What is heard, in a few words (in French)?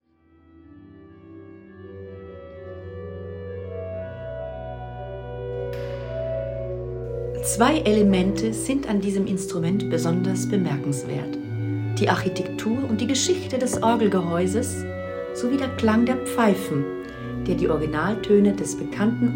L'orgue; kirche saint pierre saint paul